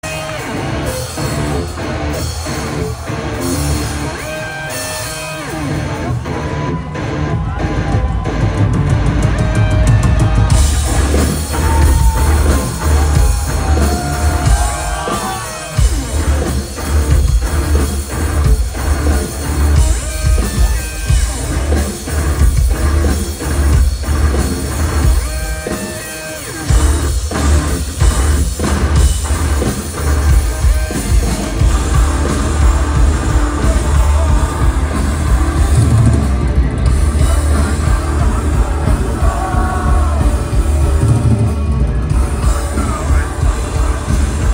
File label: riff; Hellfest 2025